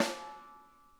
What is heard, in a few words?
SNARE, Drum Kits